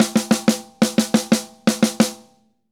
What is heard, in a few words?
Drums Poly; Best Service; Festa Latina VOL-1; Drums & Percussion